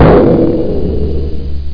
Damage; EMP; Music

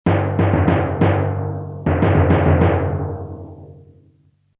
JINGLE; MOBICLIC162

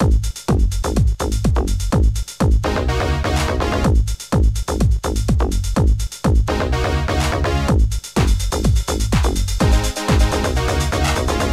Get Techno

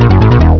Flow Stop; MacPipes 2.0

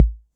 606 bass acc; tr-606

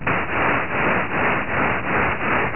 flashlight; The Learning Company

